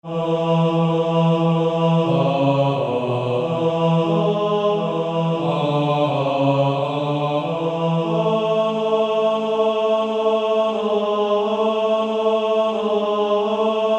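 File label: Male Choir, bass 1; Sun of My Soul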